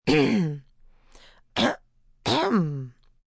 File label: Cough Multi Clear, Clearing throat, female (human voice)